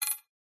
industrial metal washer drop or set down on concrete paver